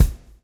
swing

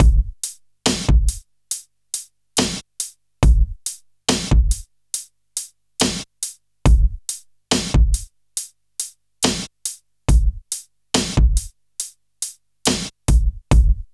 HIPHOP, Funky; INIS; Funky Xtreams

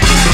FALL; E-MU Formula 4000 Series Vol. 1 - Hip Hop Nation; Wicked Hits

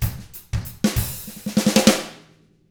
95 FUNK END, Drums; ILIO; Double Platinum Drums 1

